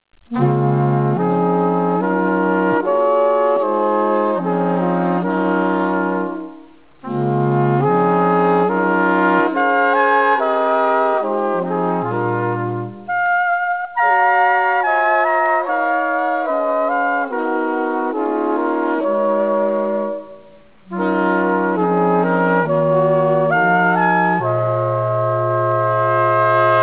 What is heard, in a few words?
lullaby